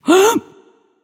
breath; smite; voicepack; hera

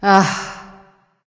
sigh; smite; artio

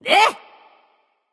nv confused; cupid

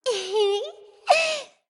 lobby landing; smite; god scylla

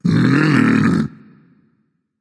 tongue, voice; Smite; ymir skin14

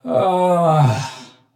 nv sigh; smite; xbalanque

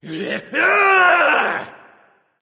transformation; cuchulainn

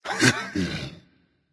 heh heh berserker; cuchulainn

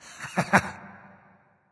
"heh heh"; smite; voicepack; ullr

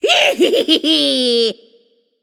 "yay"; babayaga

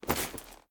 pet armor; smite; lancelot

